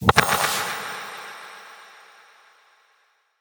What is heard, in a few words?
Memory Go Board Player Capture; Warframe; Sacrifice